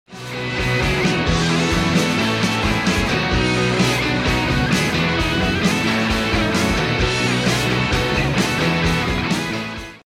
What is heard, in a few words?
Music In Style